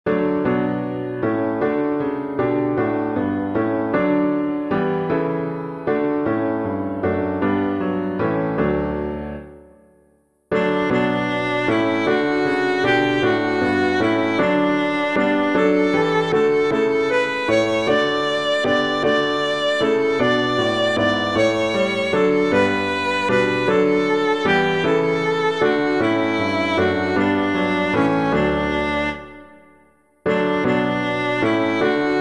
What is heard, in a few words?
piano, hymn; That Eastertide with Joy Was Bright; Neale; PUER NOBIS; Claro paschali gaudio